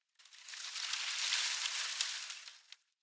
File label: Moss Stag Idle; Seer; GamigoNA